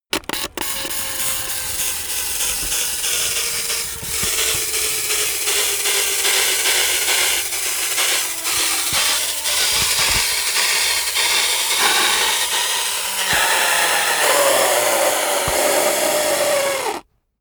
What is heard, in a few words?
bicycle spin tire scratch paper; fahrrad; verkehr transport